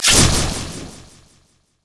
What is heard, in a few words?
critattack; LoL; yasuo skin01